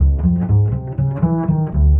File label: bass